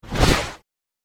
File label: nightstalker movement; physics